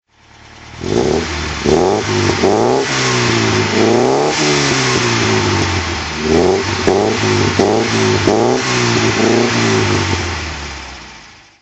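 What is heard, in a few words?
lancia 2000 coupe throttle, engine sound; Lancia 2000 Coupe, 1972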